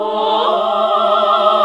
SING, CLASSIC VOX; Best Service; Hallelujah

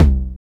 DR. LOOP, DRUMS; X-Static Goldmine 3

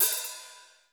DRY HAT; DRY KITS; Crypton; DrumBase5000

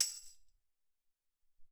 Tamb Op

